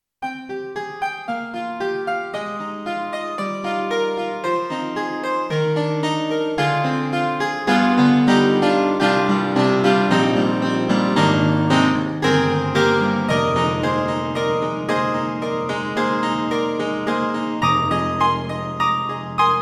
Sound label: Alesis NanoPiano, Acoustic Piano, Wire Piano